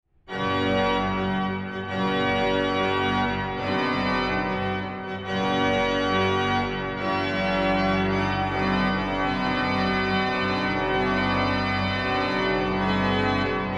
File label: fanfare